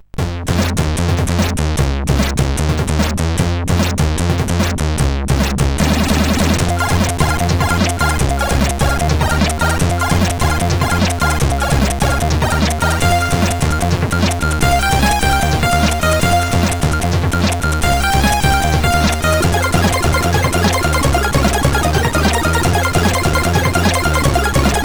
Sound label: Flatline tune, sid CSG8580R5